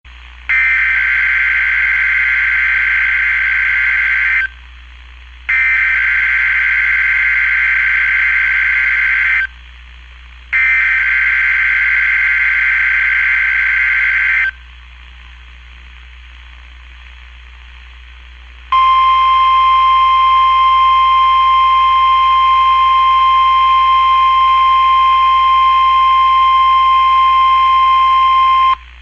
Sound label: Noaa Radio